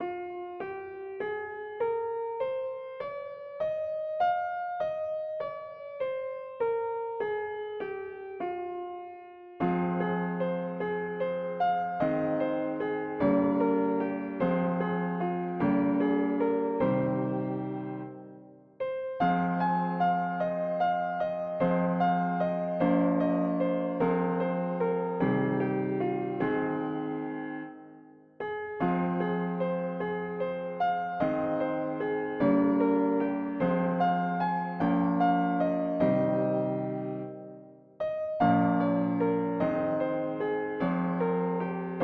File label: 16EDO Mavila7 Studies Anti Lydian